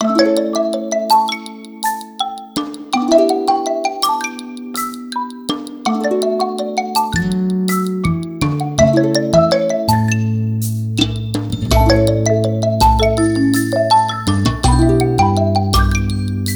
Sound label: ringtones; LG X power 2